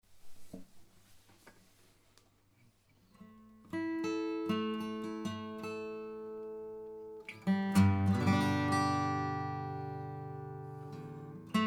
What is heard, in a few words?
flatpick celltex